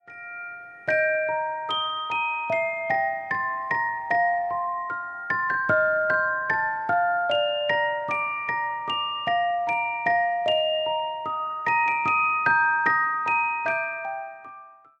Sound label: Instrumental